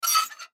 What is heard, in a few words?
Metal Scrape, Metal Scrape Rusty Surface Slide Squeak; Foley; Scrapes; Blastwave